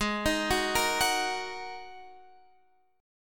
G sharp 7th Suspended 2nd, Guitar, Half Step Down

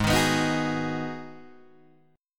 G sharp Minor 7th G sharp, Guitar Open D

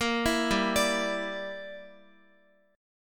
G Minor 6th, Guitar; Gm6 arpeggiated; x,x,8,9,7,9

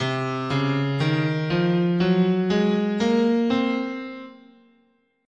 C Locrian, scale

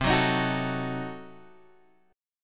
C Diminished 7th, Guitar Open F, chord; strummed; Cdim7; 12,13,15,13,12,x

